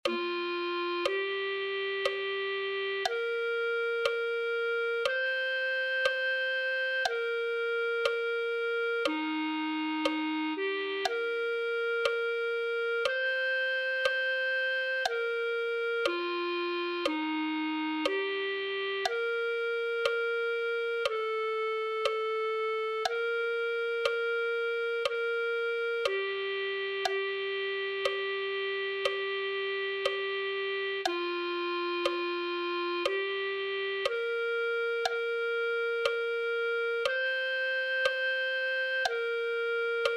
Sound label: clarinet